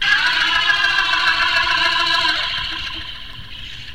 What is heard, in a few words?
filtered ahhh song